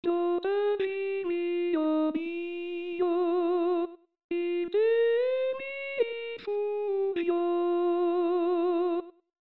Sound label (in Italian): Coro; Proteggimi o Dio; Pasqua